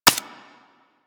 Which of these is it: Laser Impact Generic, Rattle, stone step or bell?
Laser Impact Generic